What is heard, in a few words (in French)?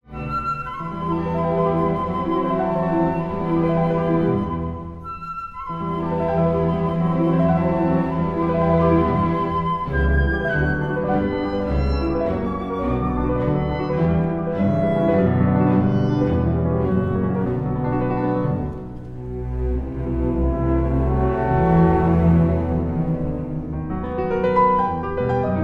concerto pour piano, allegro brillante; TCHAIKOVSKI